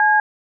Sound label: dtmf C tone; sipclients